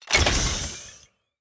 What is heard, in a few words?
Scifi Rifle Reload